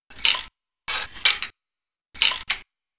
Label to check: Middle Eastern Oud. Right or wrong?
Wrong